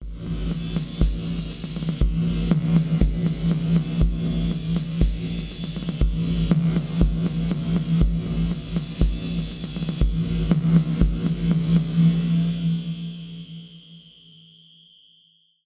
Ableton Echo Ducking

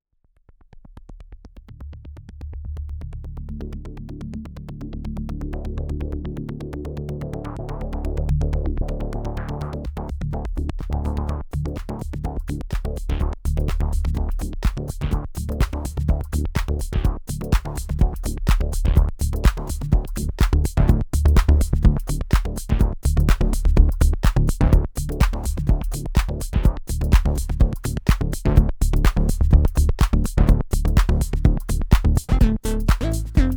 various monosequences

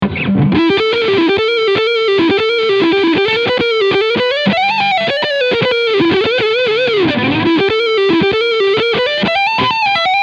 Overdrive Lead Neck Humbucker; Dean Guitars Angel of Deth

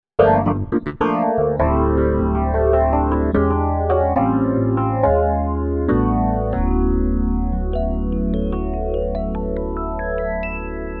Rhodes

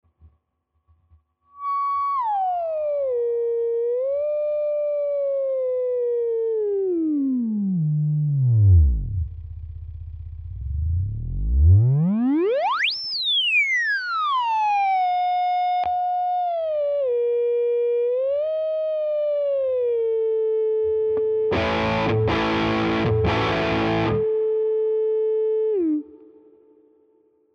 full Res Cutoff

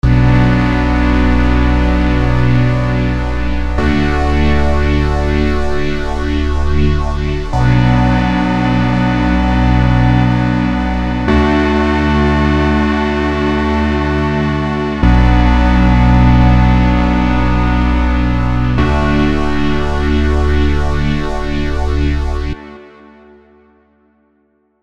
Avenger 2 Chord Art; Vengeance Sound; Melodic Tech Voices 1

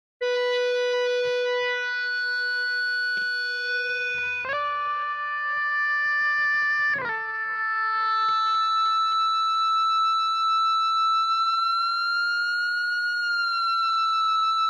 Cort MBM 2H high gain Sustainiac

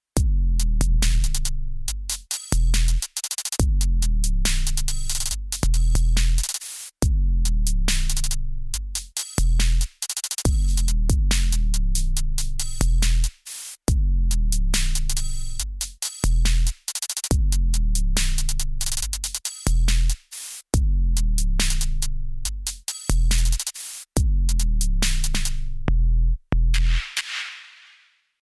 magnetismus 2 Drumloop comp

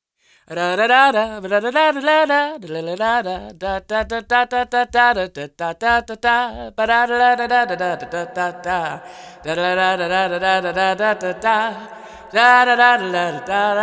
Indoor Arena

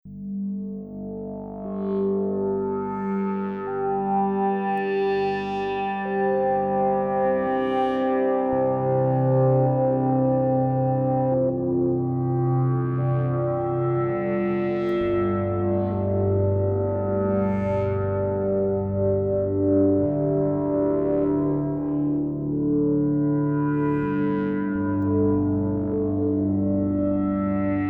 Wavetable pad

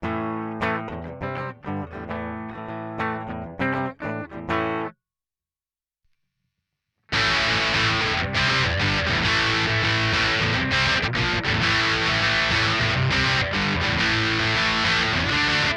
Vox Valvenergy Copperhead Drive Cab

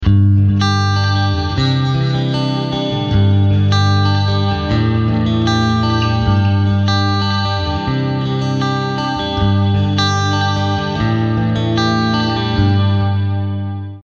clean delay reverb chorus

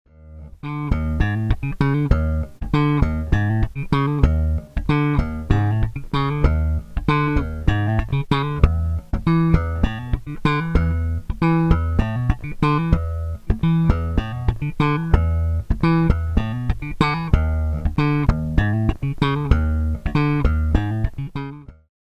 AGB200 slap

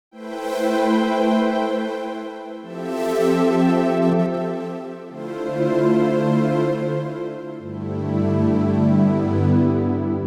Yamaha Montage M Warm Soft Syn Str

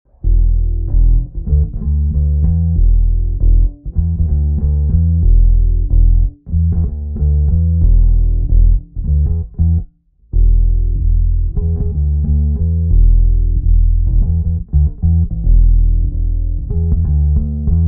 Dubsound Bass Enh Comp EQ

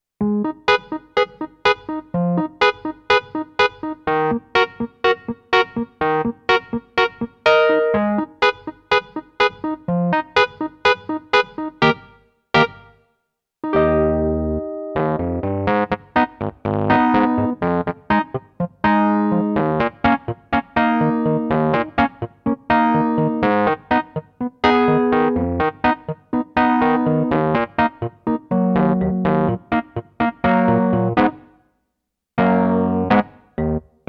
Wurly; Dexibell Vivo SX7

